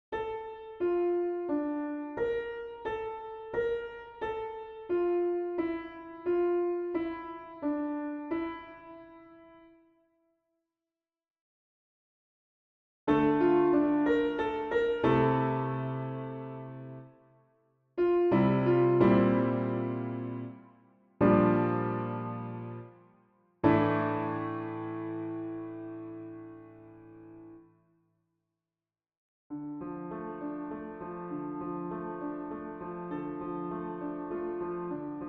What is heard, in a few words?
prelude piano; Piano (2025)